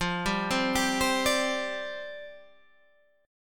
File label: C Suspended 2nd Suspended 4th, F